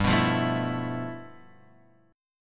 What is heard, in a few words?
G 13th G Guitar Drop D, chord